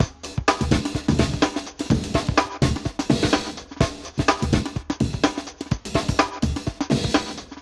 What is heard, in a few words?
HIPPOP B L; Spectrasonics; Metamorphosis